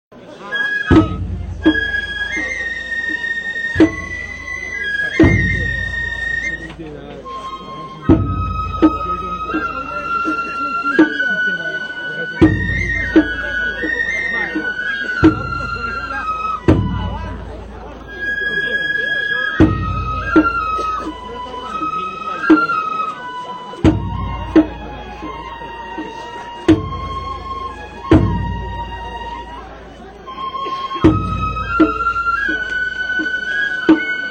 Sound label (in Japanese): 本囃子, 神楽囃子; 本囃子～後半; 別名「まぬけ」神楽囃子